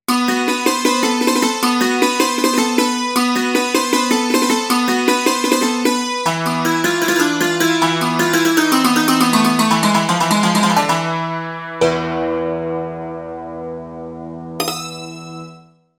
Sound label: akai santur